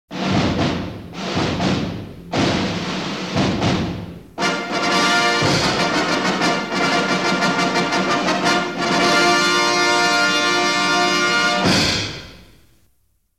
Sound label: Fanfare; Twentieth Century Fox Fanfare; Journey To The Center Of The Earth (Original Motion Picture Soundtrack)